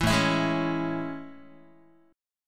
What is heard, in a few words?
A Major 7th A, Guitar; AM7; strummed; JGuitar